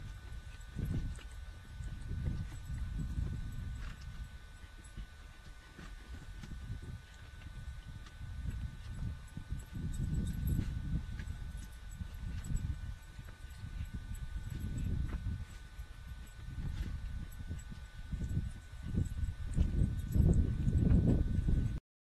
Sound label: woodpeckers